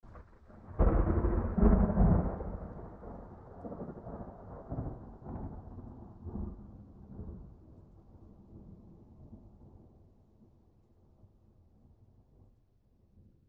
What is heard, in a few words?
thunder island